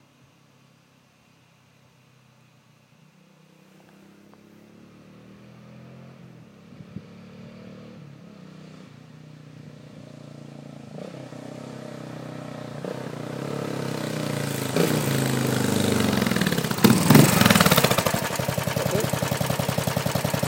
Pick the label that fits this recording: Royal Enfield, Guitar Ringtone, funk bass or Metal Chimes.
Royal Enfield